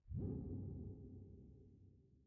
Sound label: Spawn; Cave SFX; ENERGY SFX